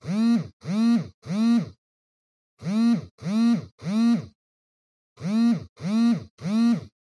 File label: Cell Phone Vibration (Cushion)